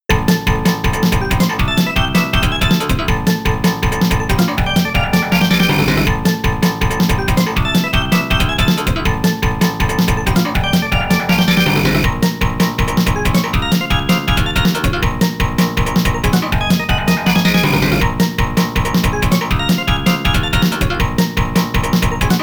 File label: Race BGM; Riding Hero; arcade